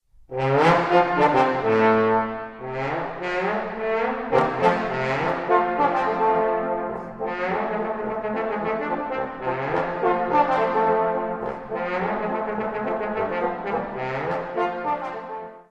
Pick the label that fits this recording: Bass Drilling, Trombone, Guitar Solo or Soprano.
Trombone